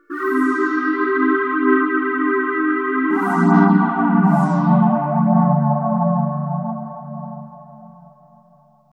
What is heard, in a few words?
synth pad ambient 160 C; Sphere